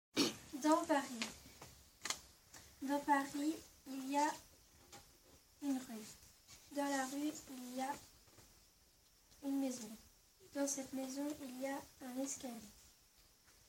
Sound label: vocal